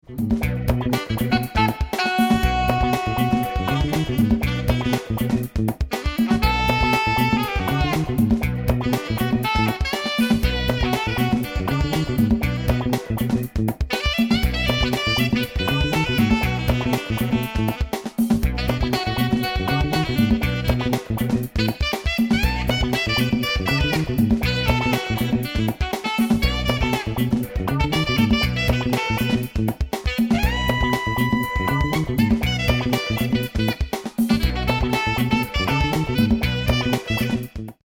Funk alto

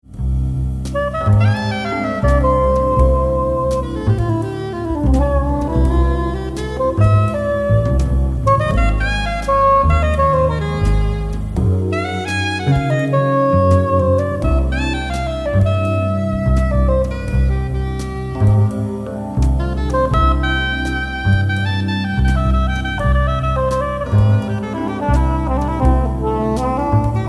Song Sop; pillinger

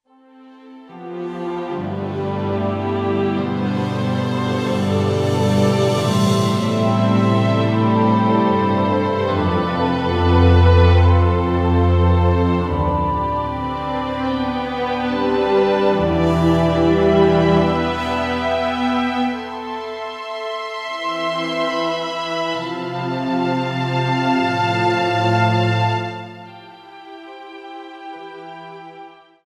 orchestra

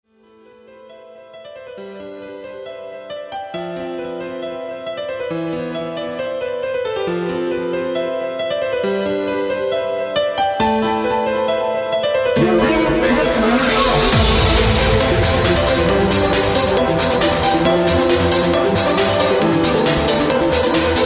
clavia

